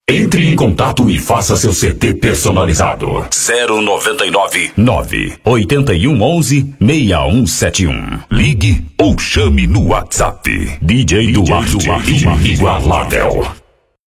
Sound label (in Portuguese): Som Automotivo